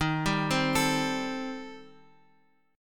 D sharp 6th Flat 5th, D sharp, down, Guitar, Open F; arpeggiated; JGuitar